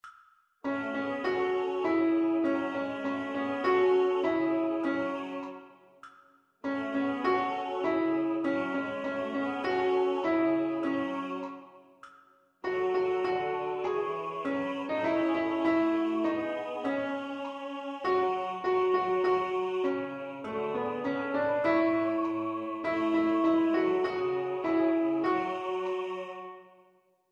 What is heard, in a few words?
Alti Piano; Martin Prend Sa Serpe